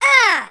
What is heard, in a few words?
pain; alienarena; invader